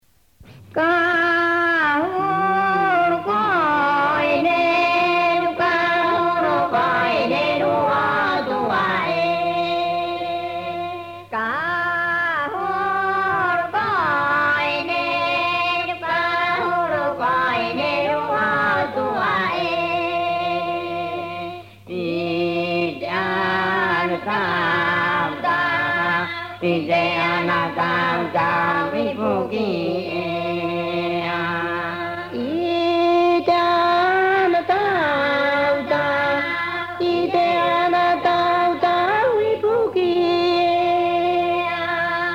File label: rapa nui; musica y danza